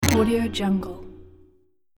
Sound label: asian button